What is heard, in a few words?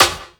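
snare drum, Drum & Bass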